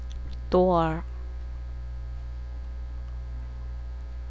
door

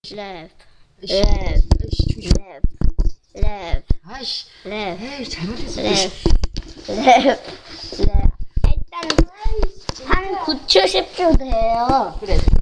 laugh

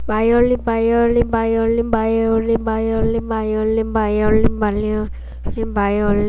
violin

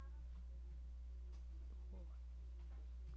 door

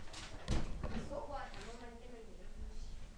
coin

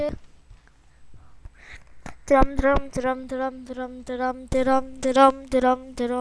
drum

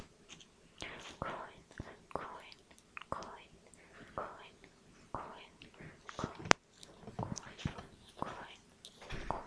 coin

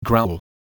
growle

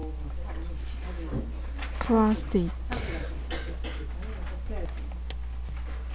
plastic

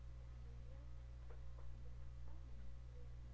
spin

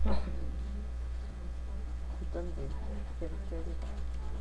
giggle

